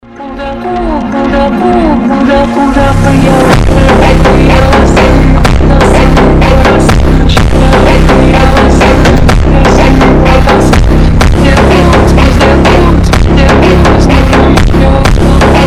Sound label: tatarian funk